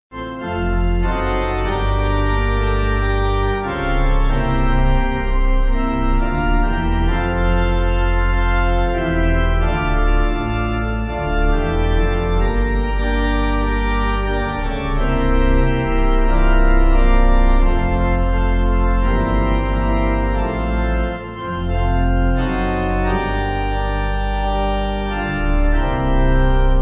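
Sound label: Pipe, pipe organ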